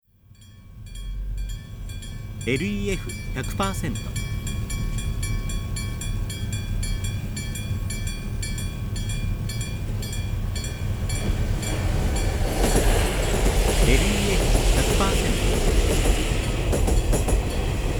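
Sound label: railroad crossing; fumikiri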